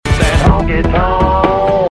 honky tonk

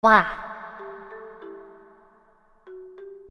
wah eco

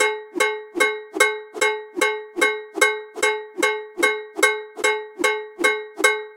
bell; alistar

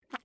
blinking sound effect; spongebob; squarepants

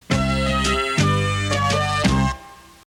punchline music; peppermint park

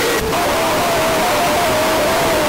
loud; fnaf

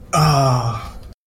moan

